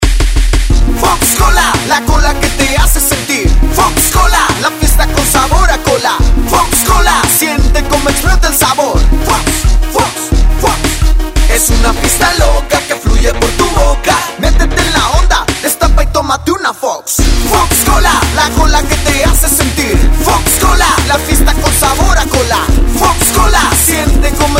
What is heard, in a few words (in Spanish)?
Jingle; Fox Cola 2010